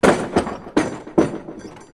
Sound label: rattle